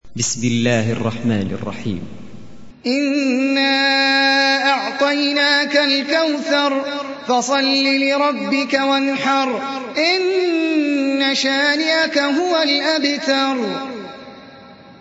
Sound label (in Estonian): Al Kawthar retsitatsioon, koraan